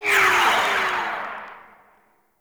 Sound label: Rifle Charge Projectile, Weapons; Warframe; Zariman Grn Wraith Rifle